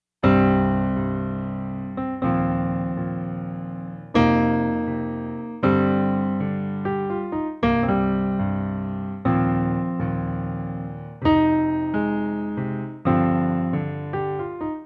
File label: power chords